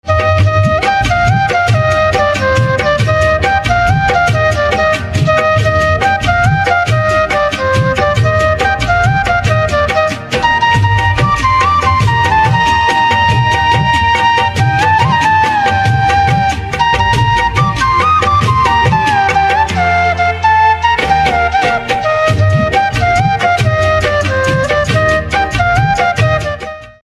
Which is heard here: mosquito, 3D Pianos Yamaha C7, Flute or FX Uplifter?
Flute